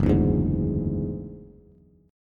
Gb 7th Suspended 2nd Sharp 5th, Bass, Bass Standard; strummed; JGuitar